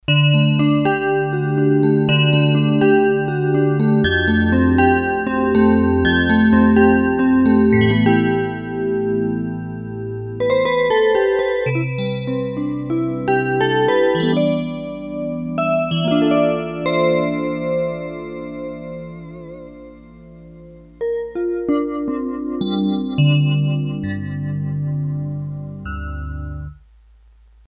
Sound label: Tiny Guitar, Korg DS-8; Factory Patches